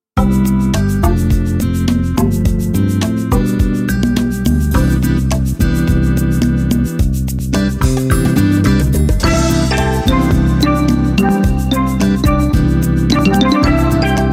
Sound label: Bossa Nova; Body